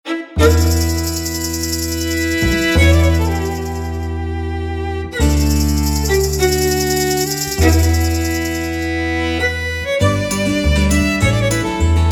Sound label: Celtic Spring